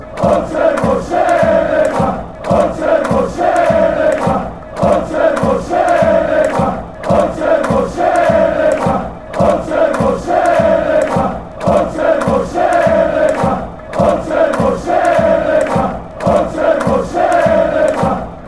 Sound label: slovenia chant